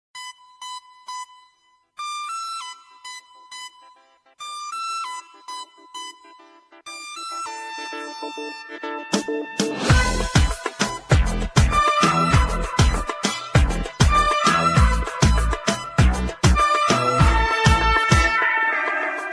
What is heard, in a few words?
instrumental Music